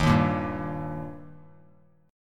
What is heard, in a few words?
Eb Major Eb down Bass Standard; strummed; JGuitar